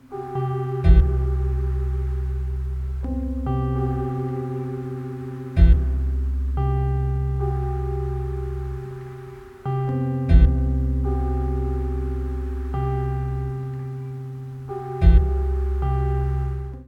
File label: loop; snippet